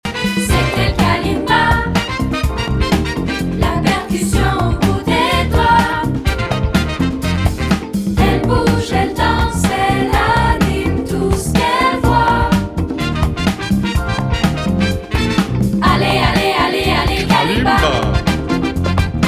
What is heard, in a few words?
intro kalimba